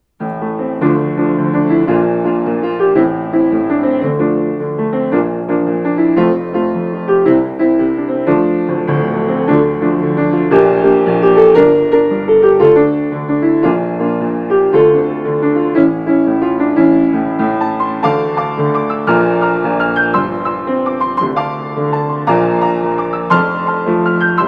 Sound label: piano